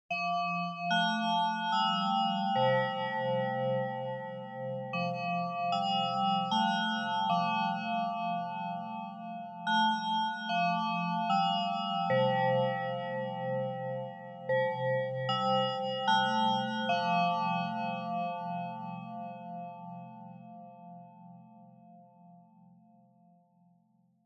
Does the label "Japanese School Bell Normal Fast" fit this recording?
Yes